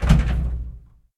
Catapulte impulsion

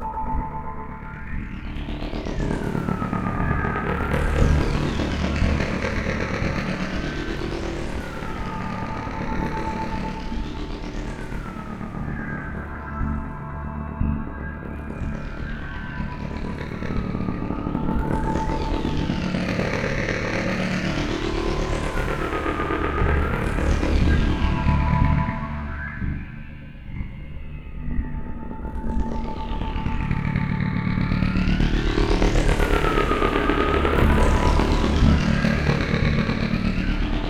Amb Centrale; Puddle Plus

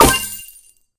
Caisse Break

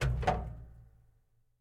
Clapet

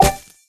Caisse Eclat

